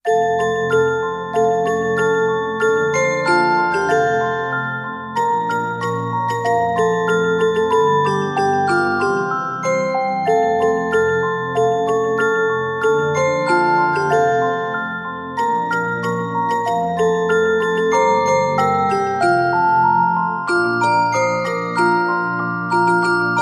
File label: Jingle Bells, Carillon Version